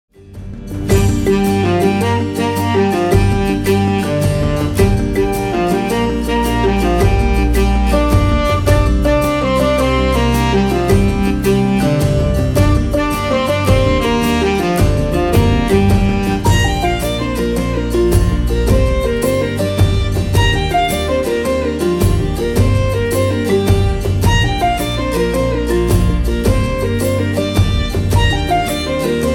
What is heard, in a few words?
Dwarves dancing a Jig